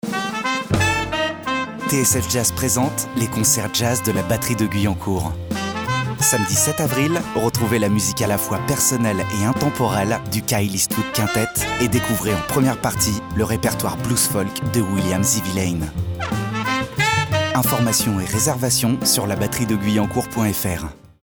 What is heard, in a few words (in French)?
Batterie